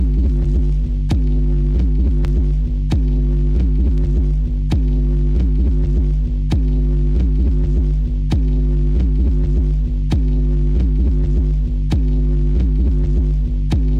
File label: Locked Groove